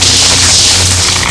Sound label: lasergun